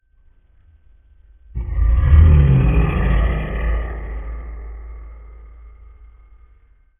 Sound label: Dragon Roar